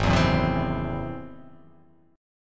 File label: Ab Augmented 7th Ab down; Ab+7 strummed; JGuitar